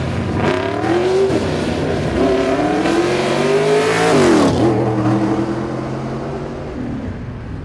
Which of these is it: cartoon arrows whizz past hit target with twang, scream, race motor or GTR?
race motor